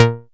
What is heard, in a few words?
Synth Cuts; Vengeance Samples; VES1 Instruments